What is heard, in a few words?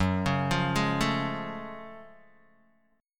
Gb Minor Major 9th Gb, down, Guitar, Open D